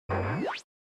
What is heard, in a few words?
Retro Anime Mech Equip Short